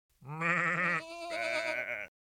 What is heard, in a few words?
sheep baa; emerald-crossing